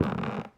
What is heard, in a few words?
Footstep Wood-Creaky